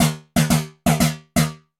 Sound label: Bounce Along, Synth Rhythmic; Ableton; Skitter and Step